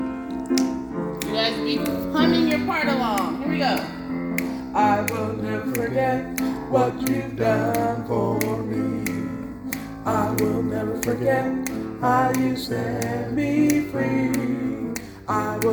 I Will Never Forget (Bass), choir practices; bass part

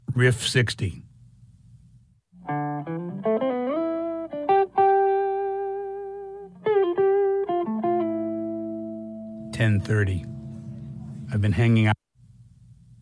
Riff

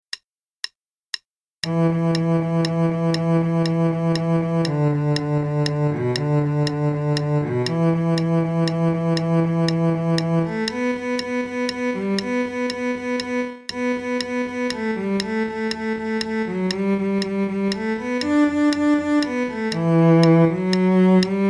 The Ants Go Marching One By One cello; French Class

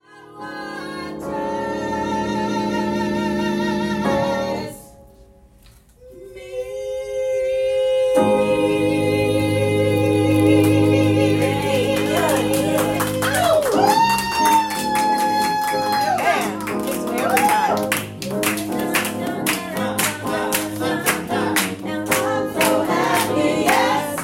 Choir; Gala Event; Rev Kev's 15th Anniversary

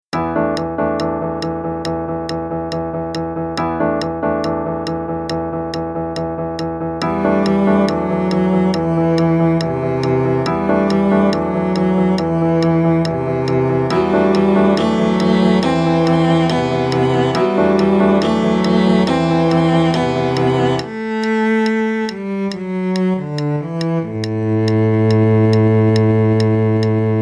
Trio Piece, full arrangement

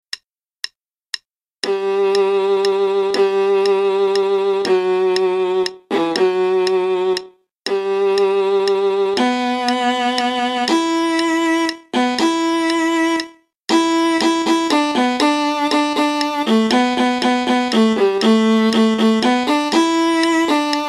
The Ants Go Marching One By One, viola; French Class

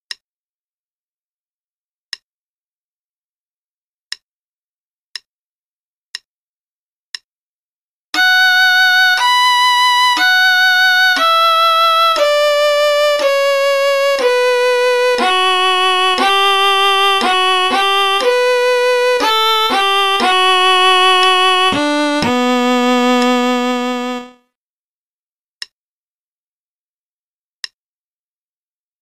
Play-a-long Concerto in B minor, qn=60; Rieding

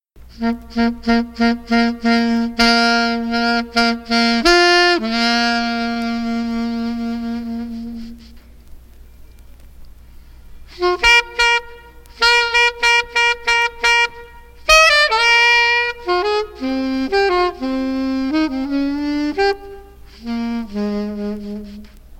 Blues; Bird 10th Memorial Concert

